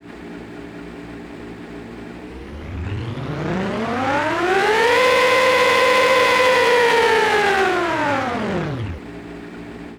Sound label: sports, engine sound